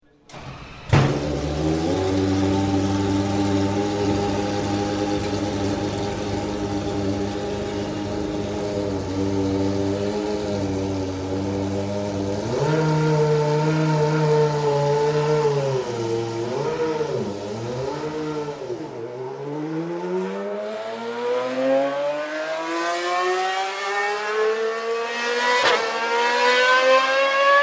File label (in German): Formel 1 Sound Ferrari F1